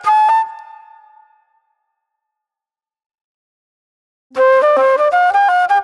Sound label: Mellow Soul Flute